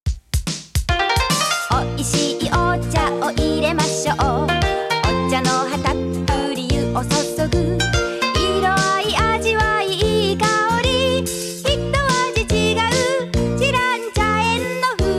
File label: cm song; chirancha